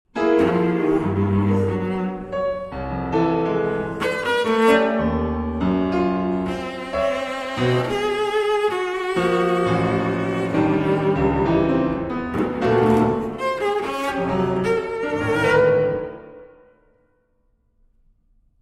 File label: Little Pieces for Cello and Piano, Sehr bewegt, Live; Op. 11; Schloss Elmau, Krün 2016